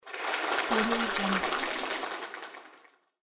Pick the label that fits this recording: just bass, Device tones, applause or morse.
applause